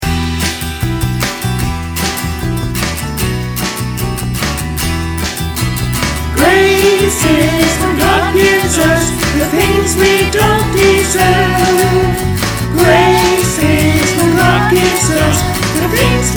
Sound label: choir